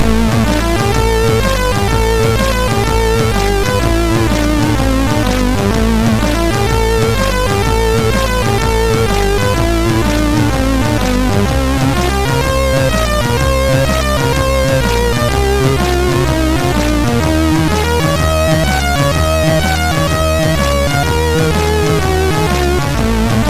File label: Loader sid MOS6581R3; Systeam Demo